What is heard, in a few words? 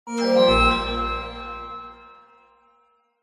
multimedia game sound negative magical